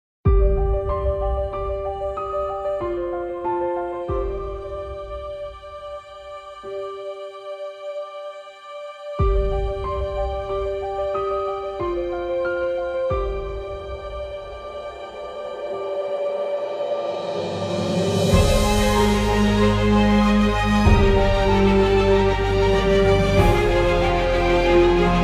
instrumental sound track ringtone

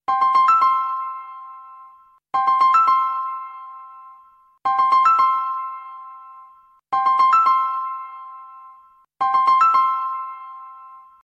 T-Mobile jingle ringtone

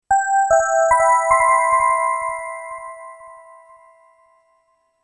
sms sound bell ringtone

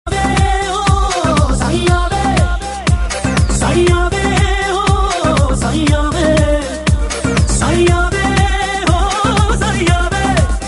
remix ringtone

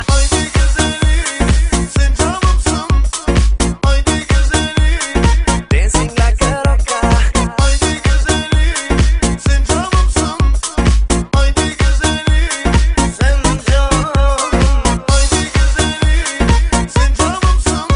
ringtone

